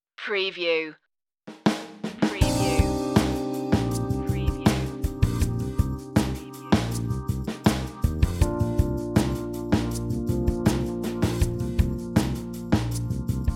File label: mid tempo rock blues